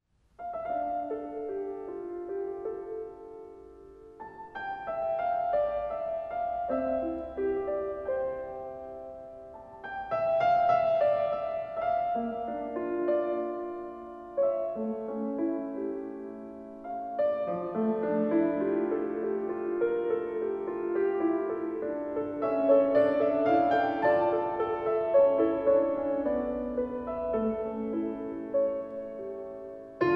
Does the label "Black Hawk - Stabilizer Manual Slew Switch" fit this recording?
No